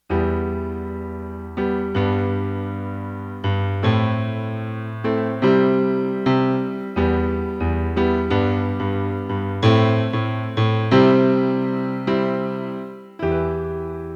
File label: piano